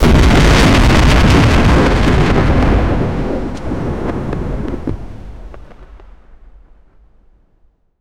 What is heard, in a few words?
a large explosion with debris scattering in all directions